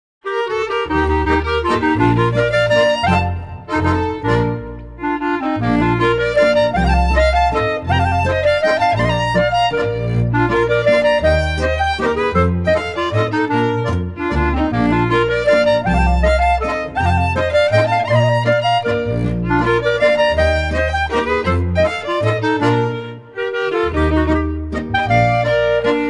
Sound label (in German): Volksmusik